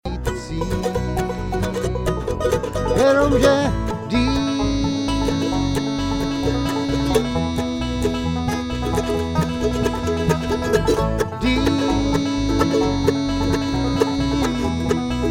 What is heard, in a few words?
vocLead, bluegrass